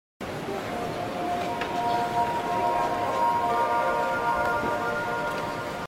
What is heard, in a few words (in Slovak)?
Stanicna znelka; Budapest